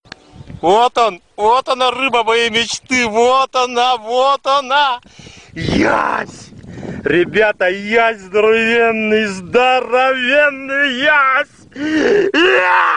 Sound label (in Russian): yaz krik